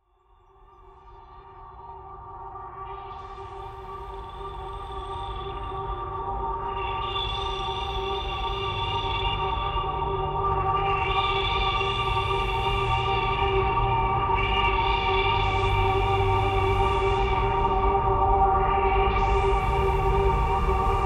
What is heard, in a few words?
Space atmosphere sound